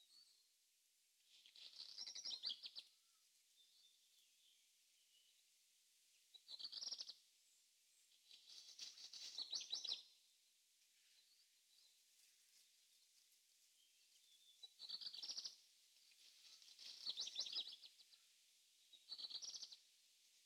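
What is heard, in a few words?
Maze Retro-Game Ambience Open-Space Loop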